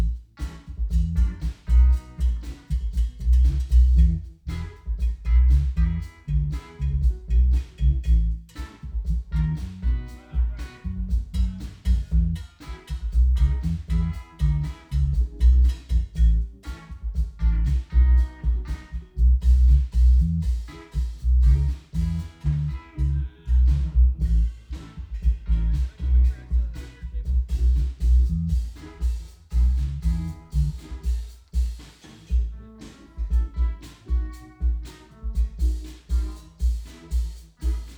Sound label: Acoustic